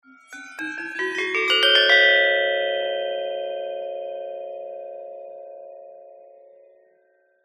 musical instrument gender small gamelan glissando upward